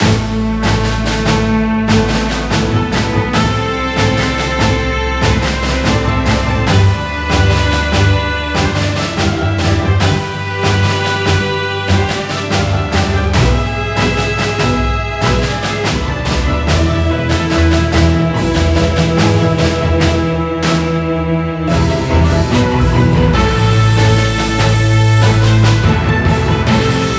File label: TENSION LOOPABLE